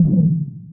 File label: thud